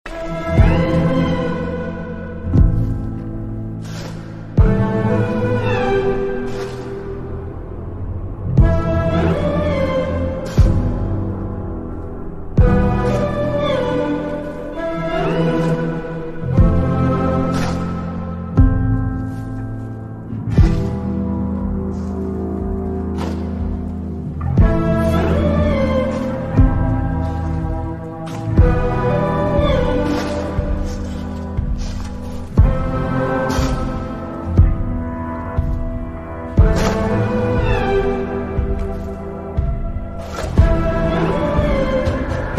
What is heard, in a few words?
Ringtone